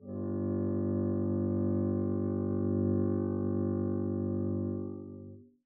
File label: arco g1 mf up, double bass; dsmolken